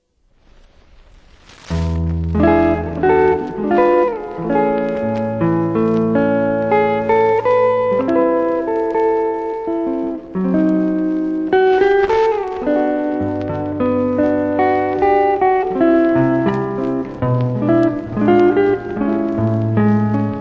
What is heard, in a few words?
GUITAR THEME